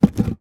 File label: industrial traffic cone heavy movement down; zapsplat